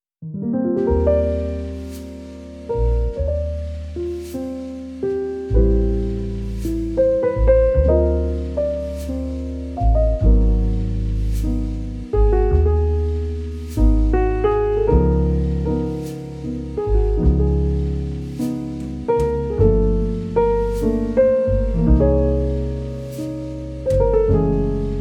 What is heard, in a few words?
jazz romantic cozy homey relaxing music